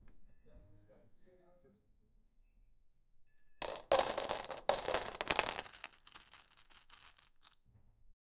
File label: dropping cereals on bowl; breakfast